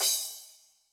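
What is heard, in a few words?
Lite Crash, Cymbal; Yamaha DTXpress-II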